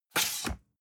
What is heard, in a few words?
cardboard box open unfold scrape short; objekte